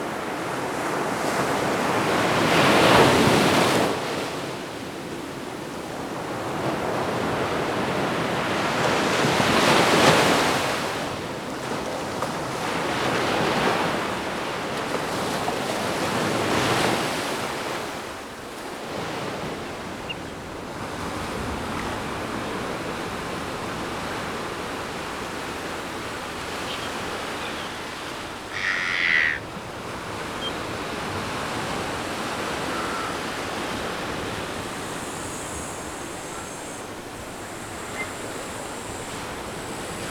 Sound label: loop start